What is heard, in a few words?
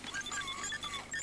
computing bleeps